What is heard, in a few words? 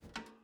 metal jump